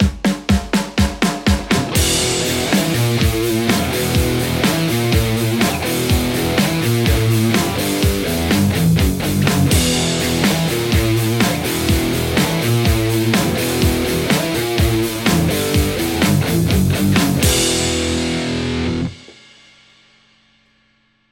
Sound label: Les Paul; igniteamps emissary v1 00